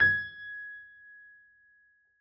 piano g#; Golden Crayon Sounds

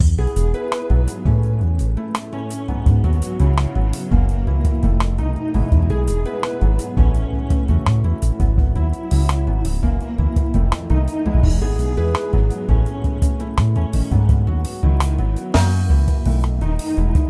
background; RS Games Sounds; Threes

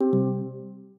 Disconnected, AirPods Sounds

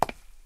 tile step; Golden Crayon Sounds

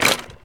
bike fall; Golden Crayon Sounds

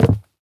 wood land; Golden Crayon Sounds

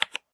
keyboard type; Golden Crayon Sounds

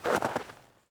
snow step; Golden Crayon Sounds

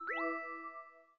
Screen Change; finn's sounds

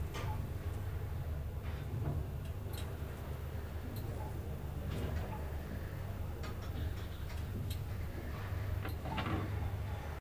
elevator loop; Golden Crayon Sounds

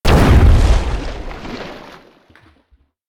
explosion; Golden Crayon Sounds

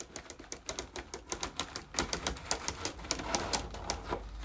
brailler roll out paper; Golden Crayon Sounds

